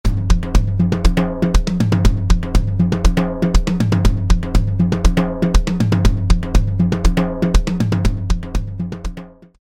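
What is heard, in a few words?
Tama Warlord with Hands Snare Off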